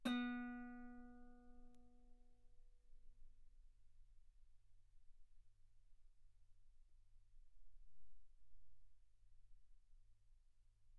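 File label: Guitar ff B string B3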